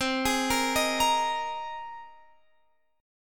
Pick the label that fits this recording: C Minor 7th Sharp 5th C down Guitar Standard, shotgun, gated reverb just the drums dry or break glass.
C Minor 7th Sharp 5th C down Guitar Standard